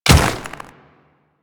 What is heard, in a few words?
Ice Step, Footsteps; Royal Guard